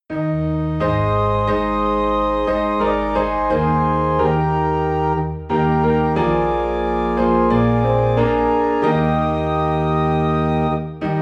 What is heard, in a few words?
Piano and Organ in E Flat; I Know Whom I Have Believed